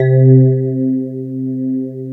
E-PIANO C2; Q Up Arts; Dream Experience

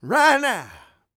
SING, Vocal Planet R&B & Dance; ILIO

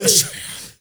M FX FILL, MALENOISE; Spectrasonics; Heart of Africa 1

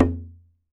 DARABUKA; AKAI S6000; DAF; WorldSounds

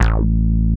DR. LOOP, BASS; X-Static Goldmine 3

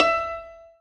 zimbal E 4; ZIMBALON

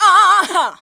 VOX, Singin

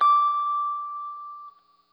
Guitar & Bass; Quantum Leap; 90's Sample CDs

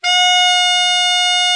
ALT, ALTO SAX; Giga Samples Collection

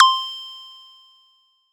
SY SQUEAK; ModelCycles

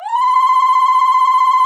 Zaghrouta Loop

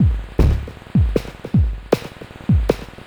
Beat, Futurist Drum & Bass; Drums & Percussion

